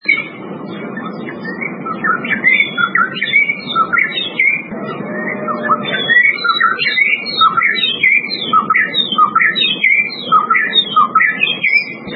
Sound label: dawnchorus